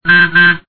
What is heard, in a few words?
bus beep; screamin upstreamin